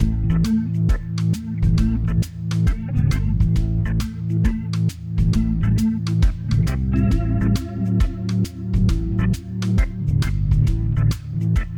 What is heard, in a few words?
Question Music; Jackbox Games; The Jackbox Party Pack 5; YDKJ